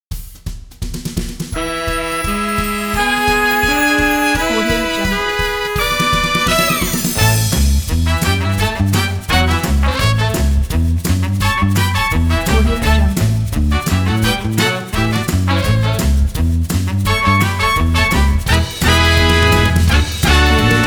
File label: game show music